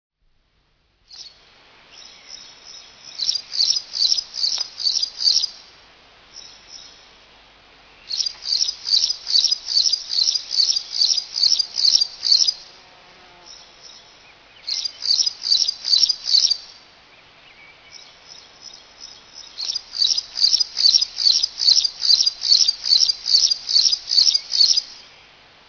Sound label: Warbler warbling; birds